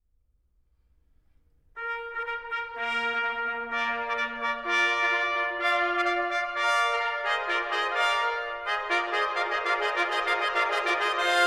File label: fanfare